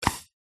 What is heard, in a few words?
leisure toy peashooter blow shoot